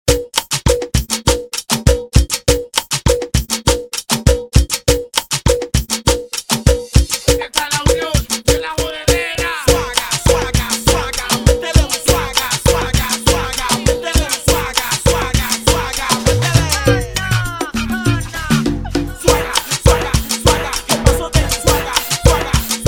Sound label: remix bpm 100; Prodeejay Remix